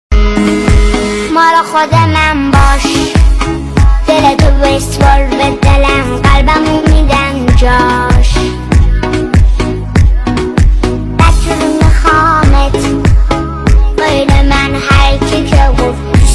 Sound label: Child Voice Male